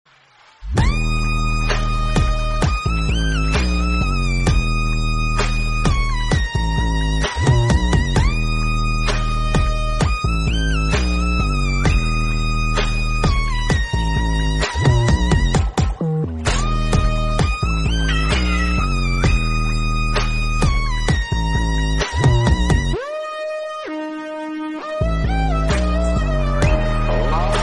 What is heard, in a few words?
intro music